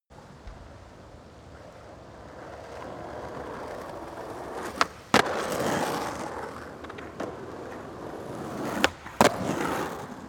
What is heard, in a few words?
skateboard double drive by jump